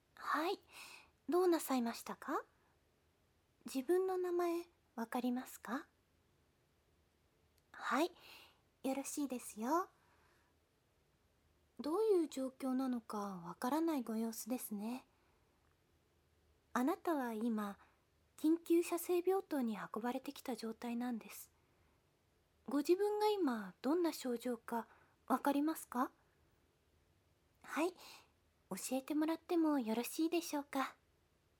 耳舐め 手コキ; すぐにヌきたい患者さま

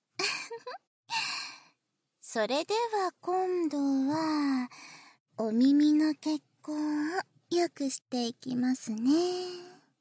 両耳囁き耳舐め（バイノーラルマイク 効果音あり）, ASMR

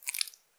膣内射精前, 引き抜かれた瞬間; ■挿入or引き抜く

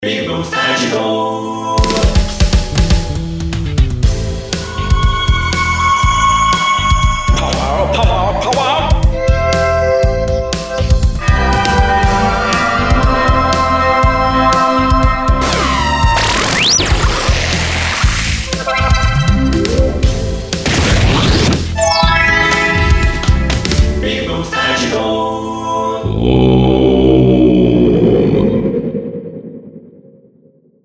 効果音素材集 回復・パワーアップ; ぴぐみょん; ぴぐみょんスタジオ

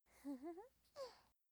笑い声; ループボイス

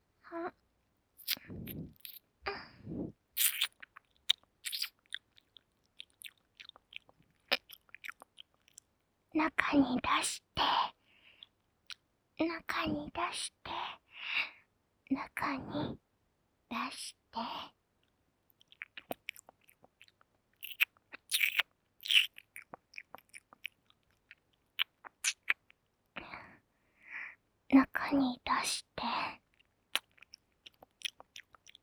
耳舐め; ハーレムオナサポ 4人のメイドがご主人様のオナニーをお手伝いするバイノーラル～文、千曜、名月、莉桜～

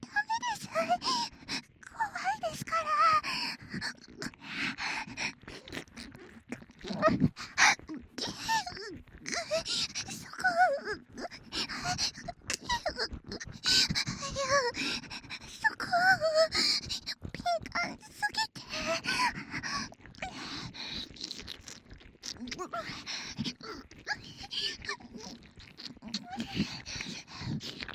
手マン愛撫・もっと耳舐め; 愛の舐め癒し旅館

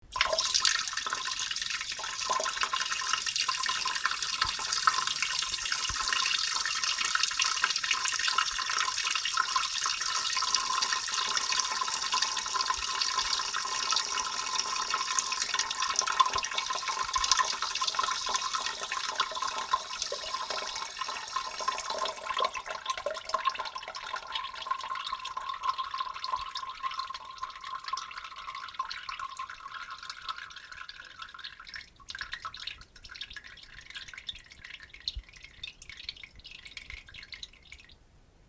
すごくがまんしてたおしっこ 最後なかなかとぎれないです

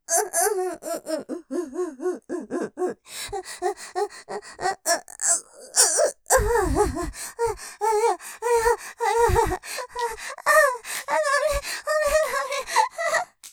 喘ぎ くぐもる声→感じすぎて笑いが出ちゃう, ASMR; 立体; ステレオ; だめぇ、だめだよぉ

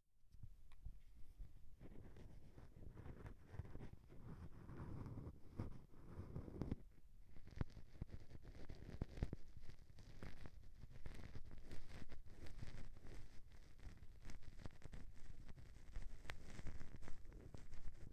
片側だけ耳かき

